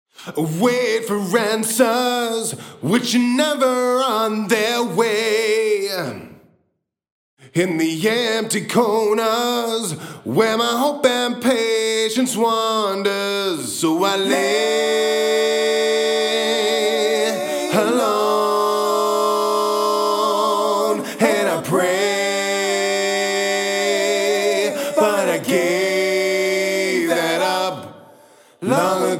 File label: SC-1 Vocal